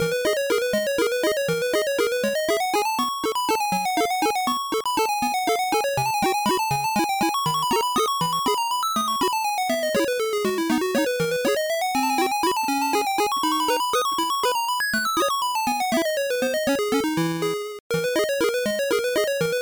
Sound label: Waltz in D-flat major; Tails and the Music Maker; Pico